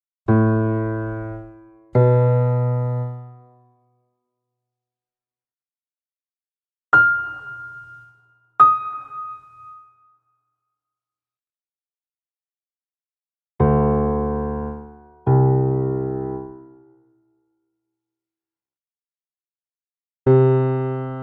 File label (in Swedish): Vilken ton är ljusast Täta intervall